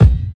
nice kick drum sound D sharp key, Royality free kick sound tuned to the D# note; kick drum samples